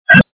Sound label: piano